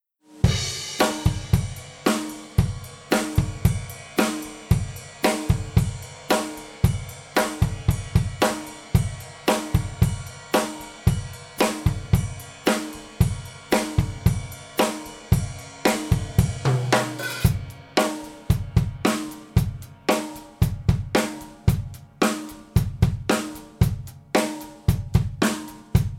Drums MS dry

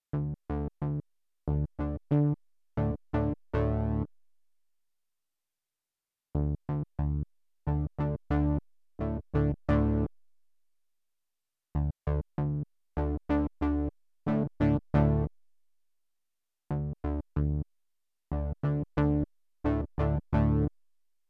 OB-X8 Stereo Test 4L4R Ping Pong Splayed Spread

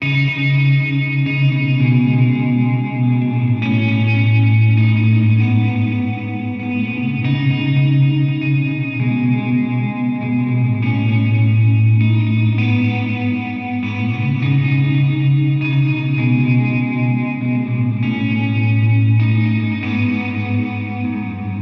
Delay Reverb Pedal; Minim

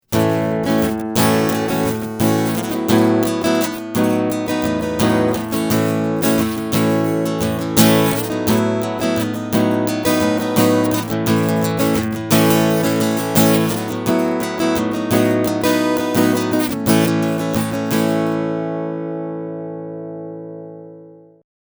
lydian strumming

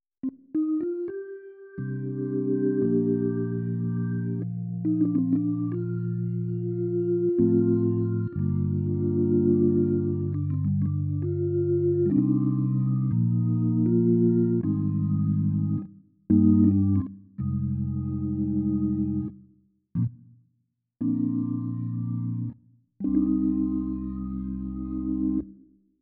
CA95 Organ

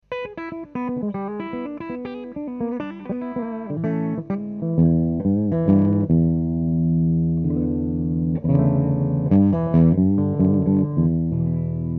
BiFET tone full ccw

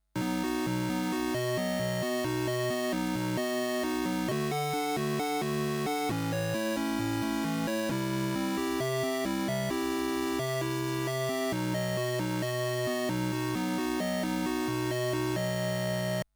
AY3 Osc LFO

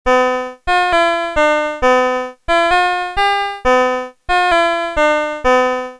Casio VL1 Flute

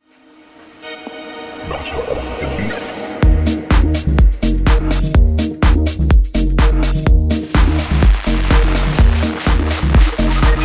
Spiral Up Spiral Down

